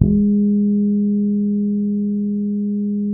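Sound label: G#3, fretless harmonics, bass; Spectrasonics; Bass Legends CD 1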